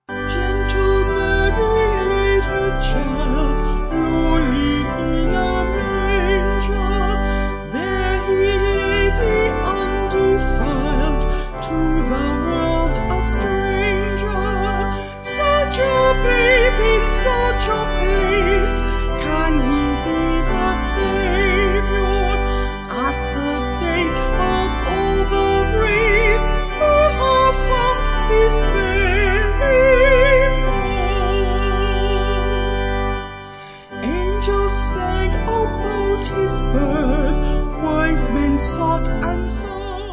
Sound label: Gentle Mary Tempus Adest Vocals Organ